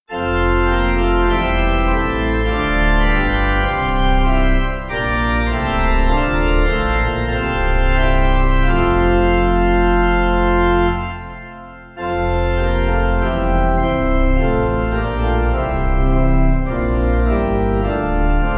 Hymn, organ; Judge eternal throned in splendor; Komm, o komm

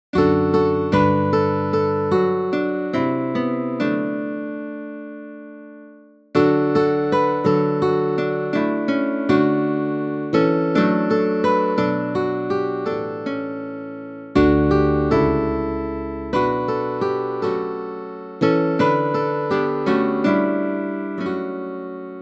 guitar Gloria; Hymnal 1982

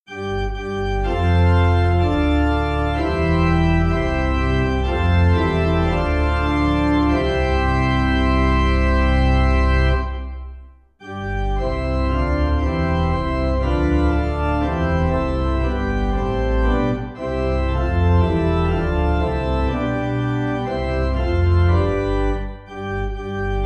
organ, hymn; st batholomews; Hymnal 1982

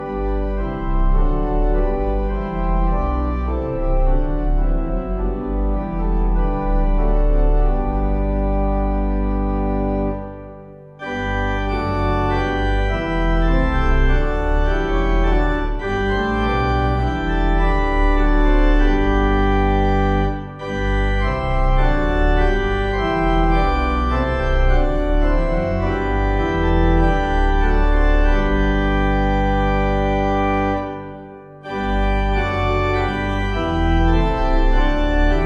Hymn organ st stephen; Hymnal 1982

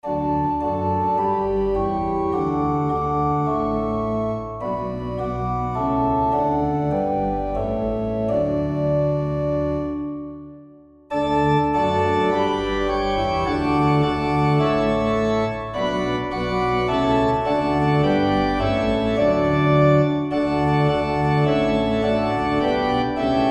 Organ, hymn; Christ Whose Glory Fills The Skies; Ratisbon; Hymnal 1982

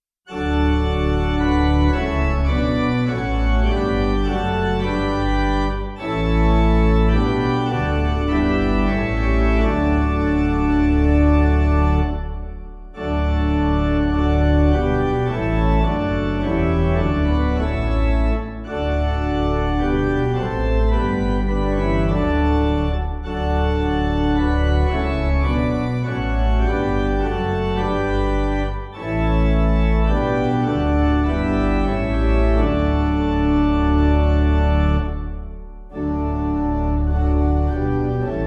organ, hymn; caithness; Hymnal 1982

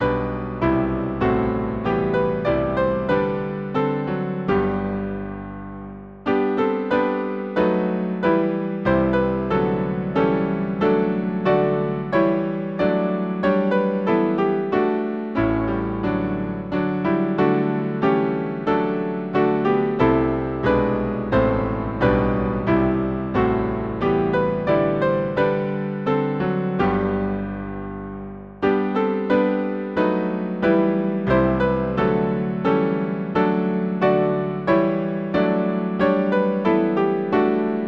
Hymn, piano; Awake, my soul, and with the sun; Morning Hymn

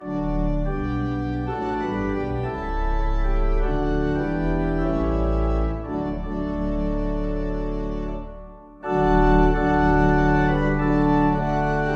organ, hymn; kremser; Hymnal 1982

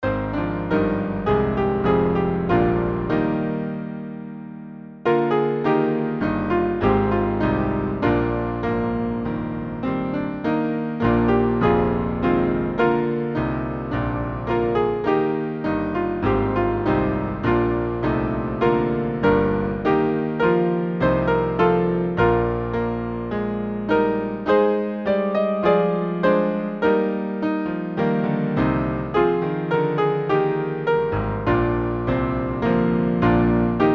Hymn, piano; Theres a voice in the wilderness; Ascension

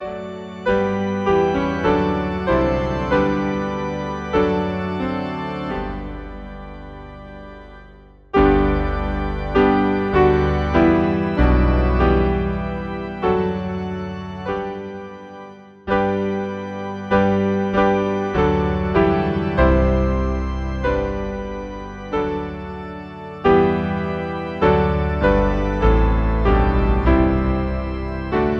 DOXOLOGY organ Piano; Kyrie Gloria Sanctus

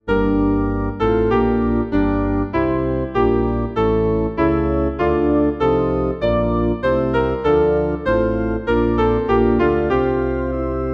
Hymn piano organ; Christ Upon the Mountain Peak, Mowsley

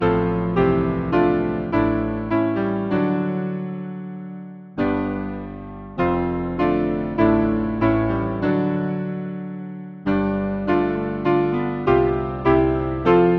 Hymn, piano; Lord Jesus think on me; Southwell

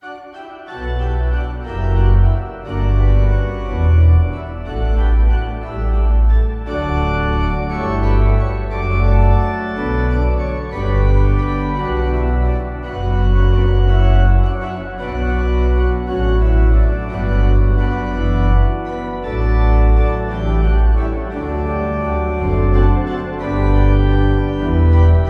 Hymn organ a la ru; Hymnal 1982

